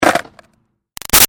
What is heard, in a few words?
Skateboard Hit Landing; Skateboarding; Sports; BigRoomSound